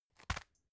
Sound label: tissue package drop soft